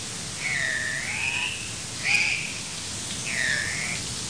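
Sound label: Andean cock-of-the-rock, Rupicola peruviana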